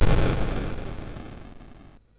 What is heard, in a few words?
sid MOS6581R3; Domination